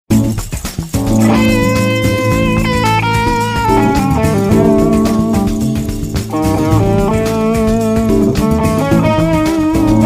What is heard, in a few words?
Drive Groove; Zen Drive